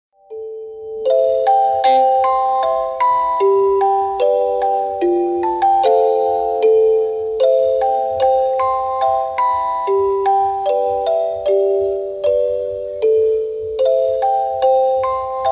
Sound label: Lullaby